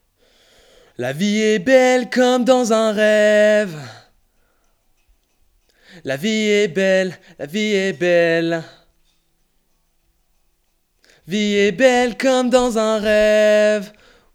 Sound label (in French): Voix principale